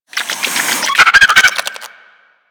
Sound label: Infested Biped Warning, Enemies Infested Moa Vocals; Warframe